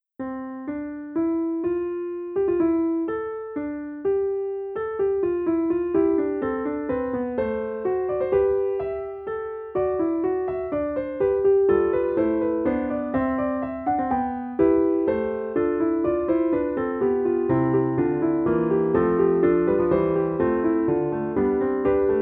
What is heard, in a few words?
Bach Fugue in C 63 BPM